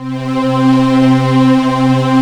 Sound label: MALE C4, CHOR; Q Up Arts; Dream Experience